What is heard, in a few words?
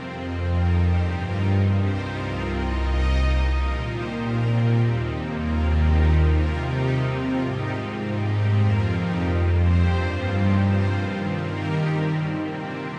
Spring Slope Ascent; Proteus